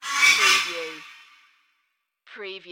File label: Reverb Hit Twice